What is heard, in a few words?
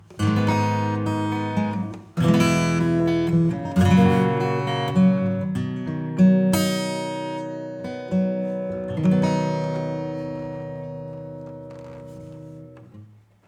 acoustic Nonlinear; Seventh Heaven Professional